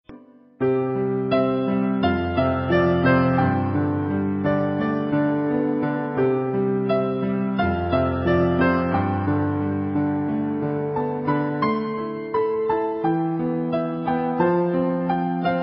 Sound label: piano music